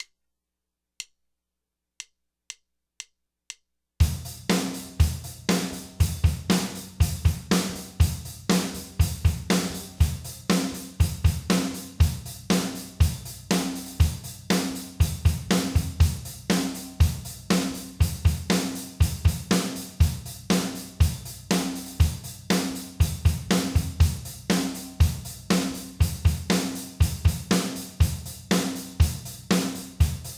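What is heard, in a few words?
Drums 120bpm; Test 32 bars; Acid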